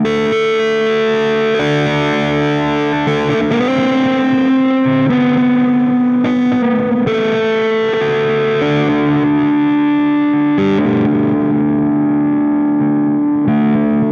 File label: Guitar, Indie Rock Anthems; Sonic Foundry (Sony Creative Software); Session 04 - Dubwyzz